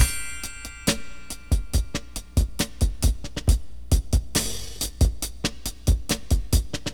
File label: Breakbeats